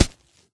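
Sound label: footsteps (soil); misao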